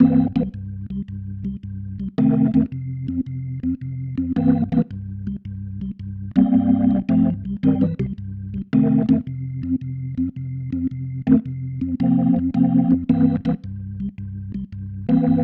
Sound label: Progression, Organ, Loops